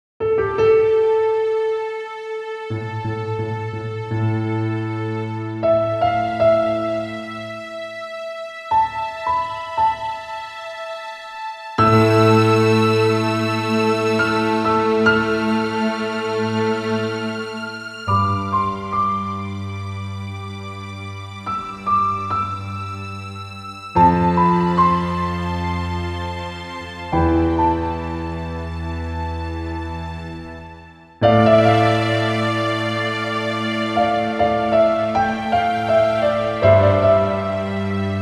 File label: Piano Concerto